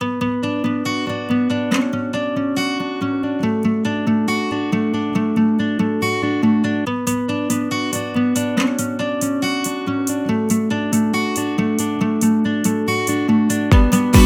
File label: Sound of Strings; Town Pack OST